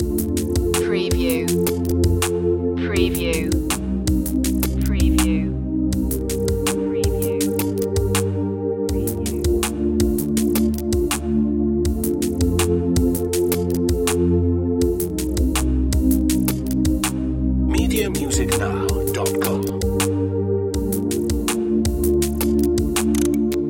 space wind loop

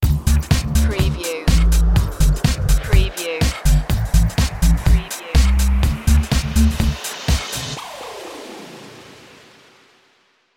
feel the beat sting

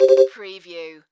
Slot Machine Musical Ditty

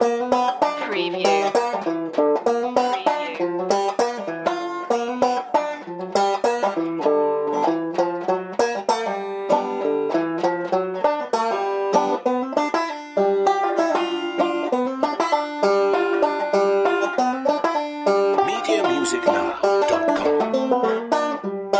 catfishin loop

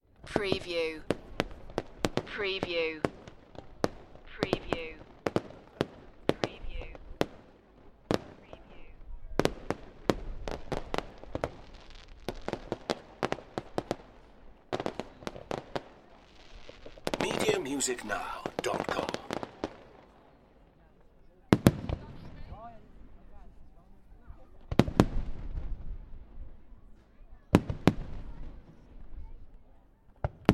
Fireworks Display Bang and Sizzle

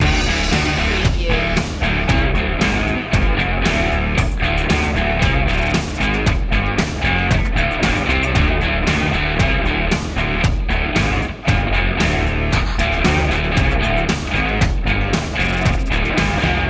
hard rock loop

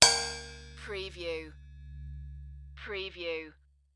Cartoon Drum Cymbal Hit

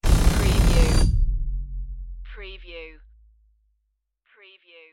space lift door

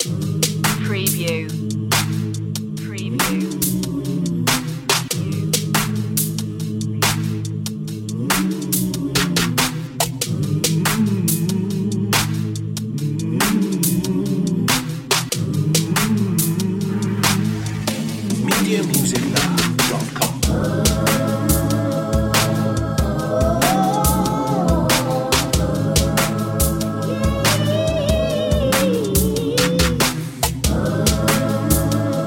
gospel heartache 94bpm

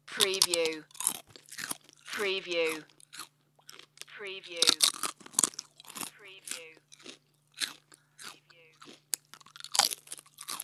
Chewing Celery

